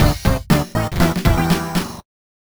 Win; Diamond Software Shuffle; WarioWare D.I.Y.

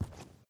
foam floor

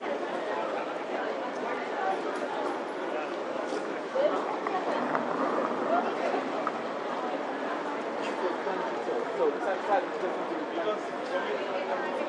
railway; Daily Chthonicle

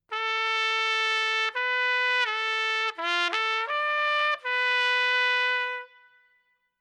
(Trumpets)

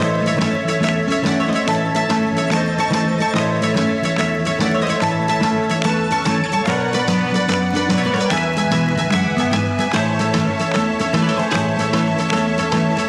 Skipping Syrtaki

